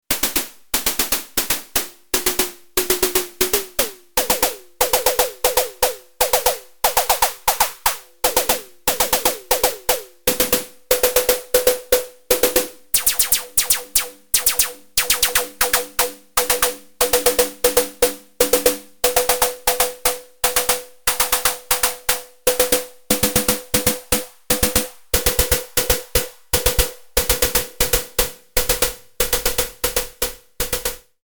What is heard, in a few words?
Dreadbox Drips Snare Noise VCO LP FM